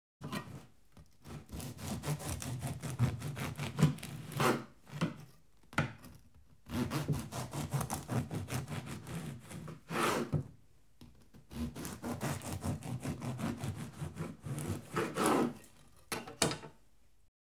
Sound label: Bread Being Sliced - 3 Slices; Household; BBC Sound Effects Library